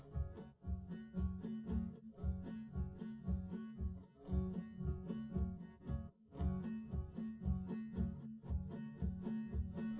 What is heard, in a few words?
drums live rec 250ms 1m; SiSEC11; underdetermined